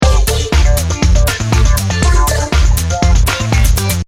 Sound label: Duck Funk; sons do habbo